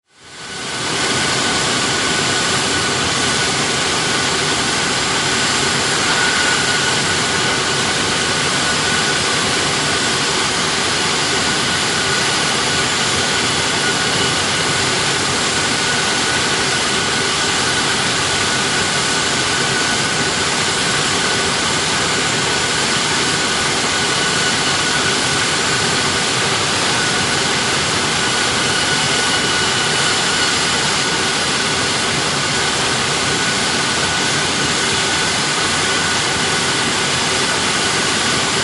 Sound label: IdleClose